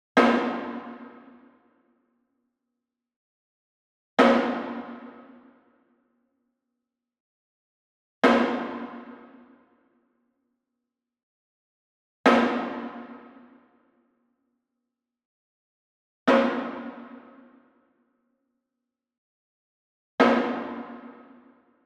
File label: Wood Percussion Wood Drum Floor Hit Beater Far; boom library; Cinematic Strikes Construction Kit